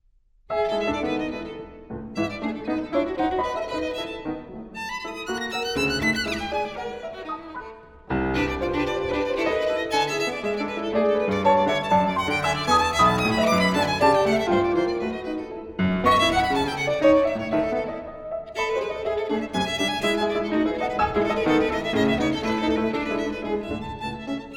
Sonata for Violin & Piano - Fast, Spirtely And Impetuous